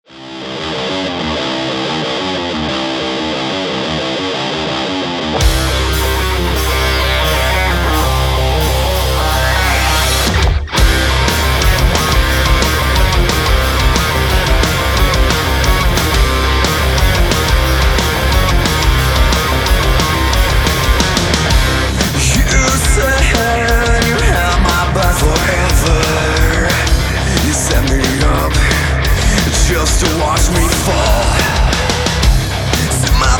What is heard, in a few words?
rock metal